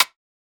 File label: perc aggro; Hip-Hop; Drum One Shots; SD CAICOS Hip-Hop Sample Pack; Slate